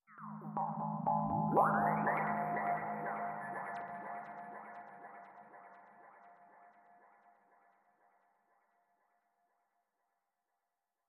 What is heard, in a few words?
Electric Noises